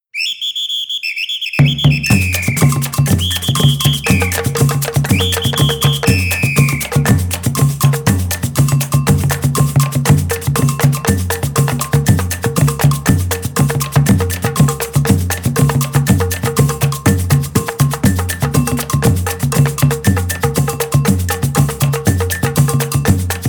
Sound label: bird whistle